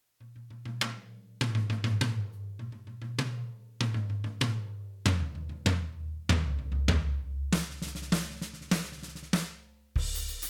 ALESIS Strata Prime fat kit

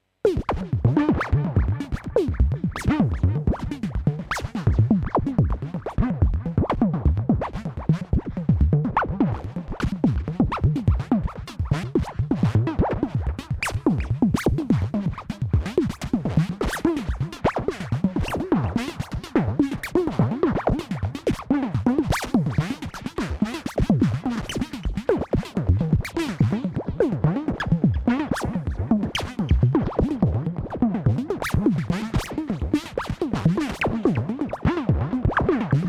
Moog Labyrinth Sequence

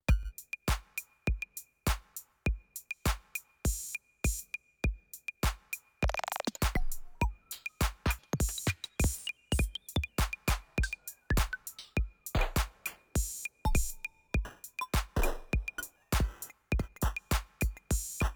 Coarse Tape Speed; Bastl

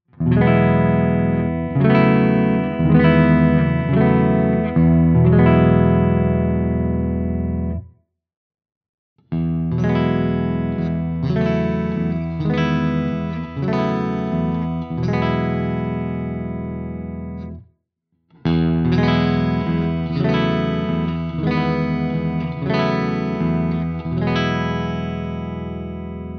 clean chords all positions

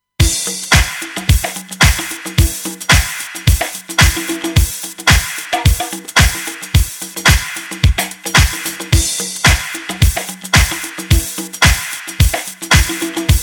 Beat Saturation; Bettermaker Mastering Limiter V2

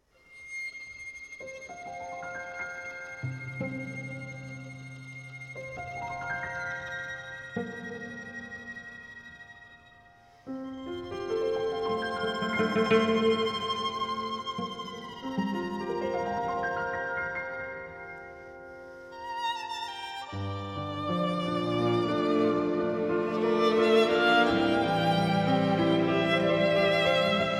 Klassik Trio mix; Savant Audio QRS; Relab QuantX

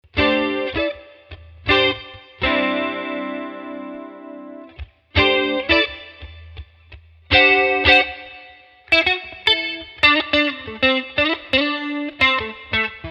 Fender Twin Chorus Spring Rev; Nux Trident 81B

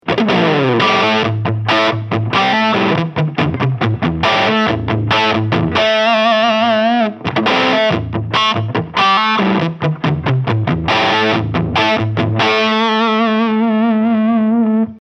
Light Gain; Walrus Audio Silt

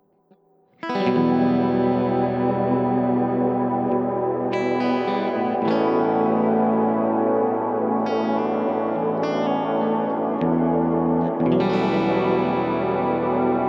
Ambient Haze; Walrus Audio Fundamental

